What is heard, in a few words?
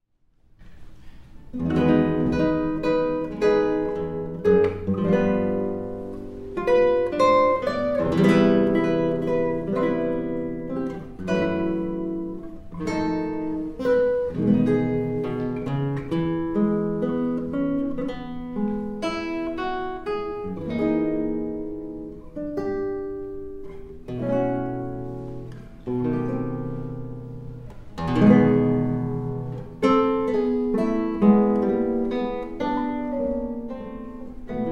guitar